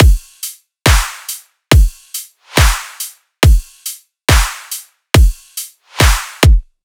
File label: Riddim Drum Loop Full 140; Drum Loops; Ghosthack; Riddim Essentials 2020